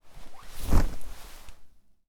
M4 Melee Swing; zps; Weapons; Firearms

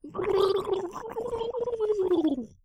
female drown death; zps; Humans

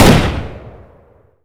ak-47 fire; zps; Weapons; Firearms

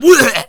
attack, Zombies; zps; Z Punk

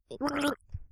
female drowning; zps; Humans

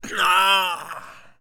Male Pain, Zombies; zps; Male1

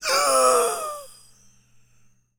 Male Death, Zombies; zps; Male1

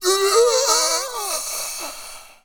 pain, Zombies; zps; Z Cop

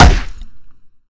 Bat Hit, Melee; zps; Weapons

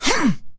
Melee Grunt, Human Male; zps; HumanMale-01; MeleeGrunts

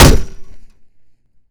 Chair Hit, Metal Chair; zps; Weapons; Melee

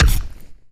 Hit, Zombies, Attack; zps

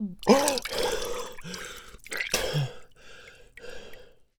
Air, Water, Humans; zps; HM Water

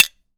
Grenade Bounce; zps; Weapons; Explosives

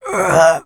pain, Zombies; zps; lea

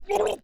Drowning, Humans; zps; HM Water

